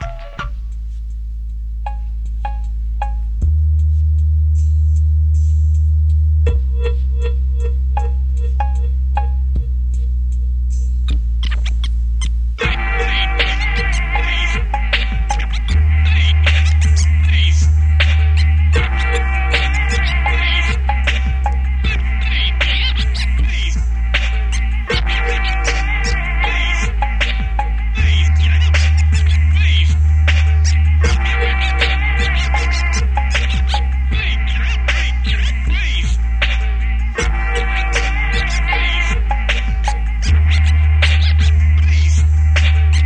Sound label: bass